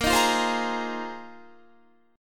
A sharp 7th Suspended 2nd Suspended 4th, Guitar chord; strummed; chordshape; 17,18,17,17,20,18